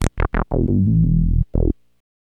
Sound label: Synth Bass